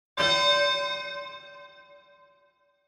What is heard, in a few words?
Boxing Bell